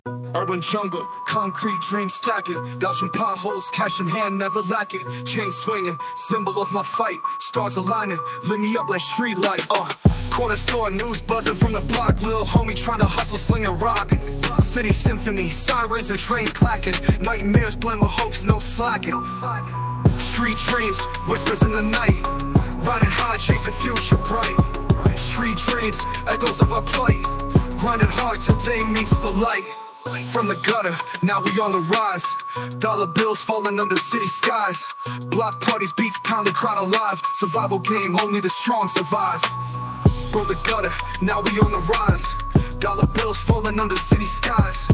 hip hop vocals

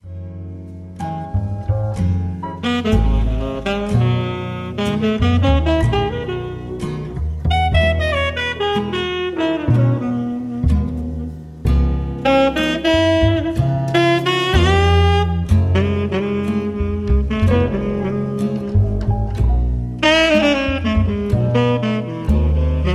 jazzy nostalgia

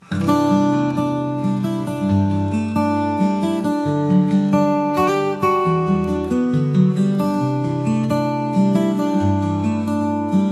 guitar

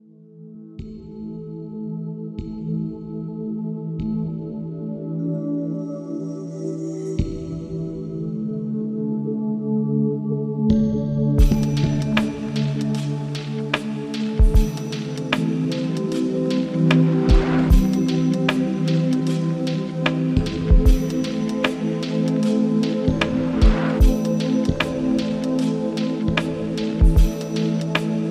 oriental soundscapes temple chimes

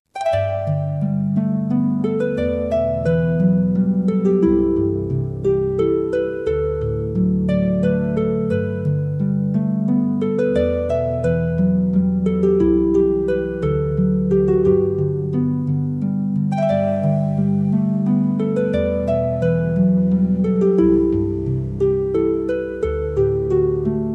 celtic harp

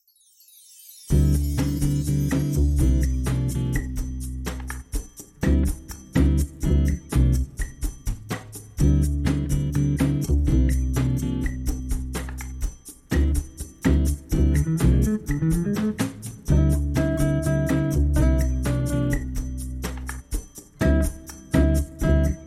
swing jazz Bossa 432 hz

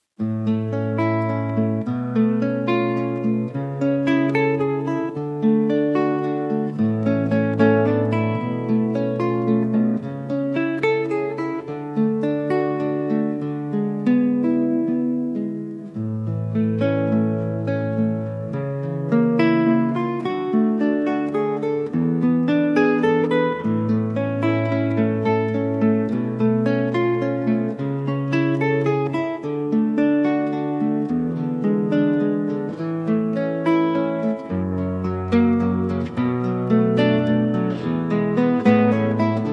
guitar and sunny calm waves

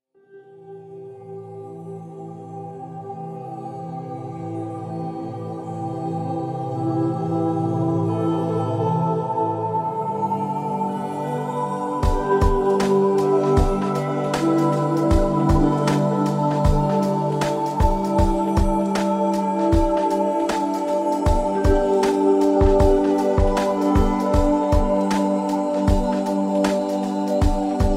oriental soundscapes

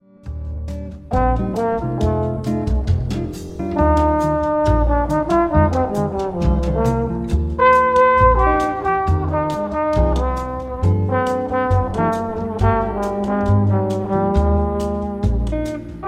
nocturnal jazz melodies

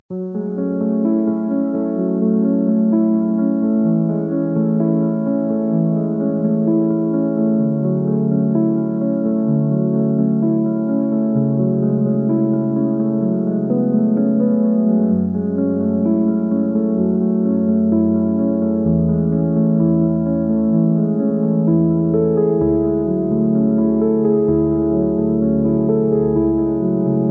silent piano, Relaxing Melody

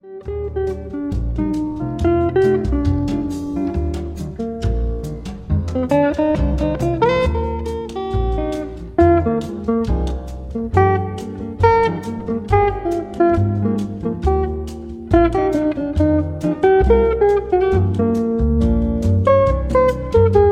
nocturnal jazz melodies

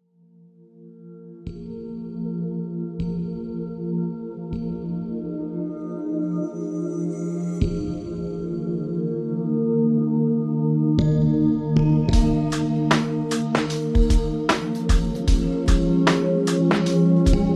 oriental soundscapes

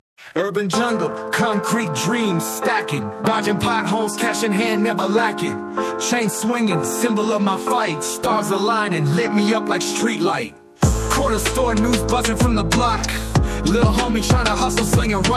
hip hop vocals Urban Rhythm

